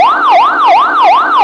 yelp, sirens; code3 sweden

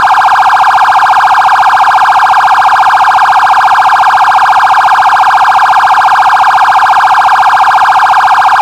pier, sirens; soundoff nforce 400

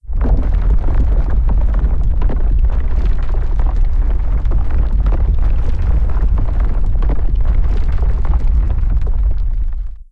Earthquake; blue archive

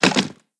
Drop Gun; blue archive

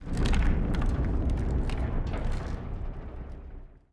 Burning; blue archive

